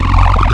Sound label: gurp; fuel